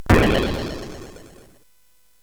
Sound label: SFX; Narco Police; hvsc